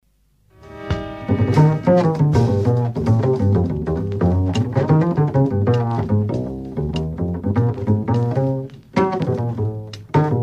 Pizzicato contrabas

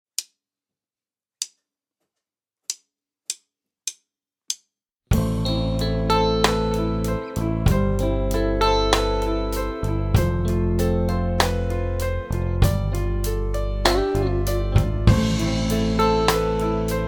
Improviseren met a g en e

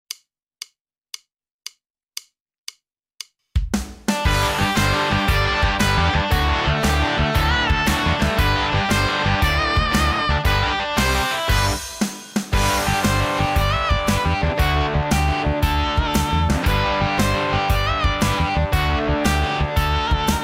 Begeleiding blokfluit fruitlied instrumentaal

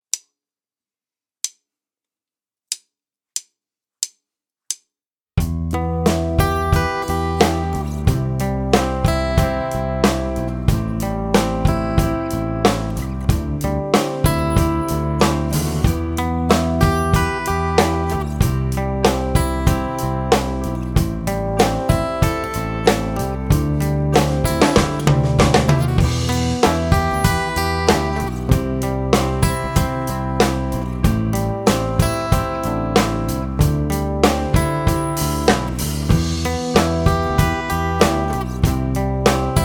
Improviseren met e en g

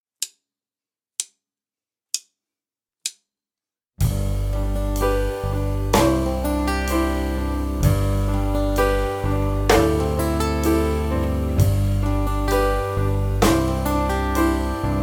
Improviseren met g a b d e

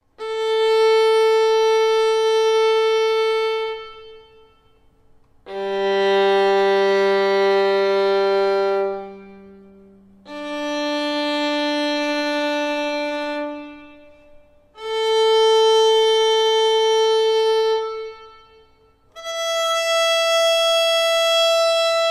Stemtonen viool

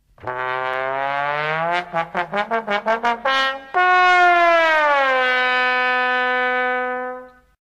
Trombone voorbeeld glissando

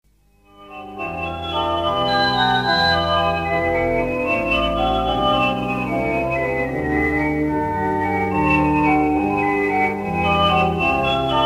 Orgel

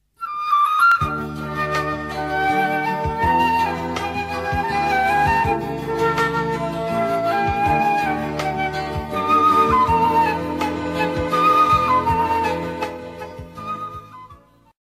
Houtblazers dwarsfluitfragment

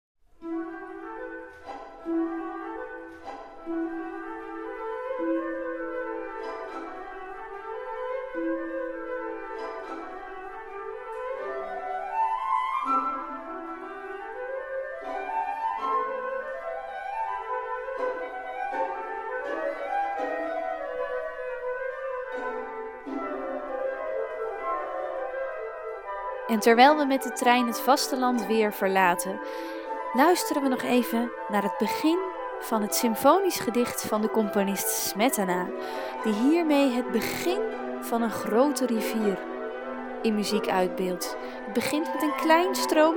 solo klokkenspel

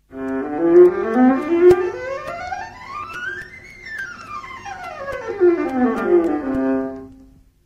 Altviool laag hoog laag

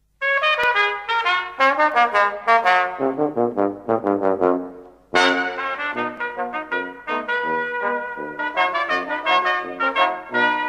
blaaskwartet tuba trombone hoorn en trompet; Speelt er een tuba mee

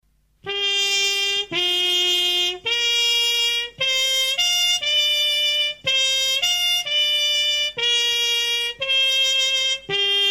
De trompet met of zonder demper met

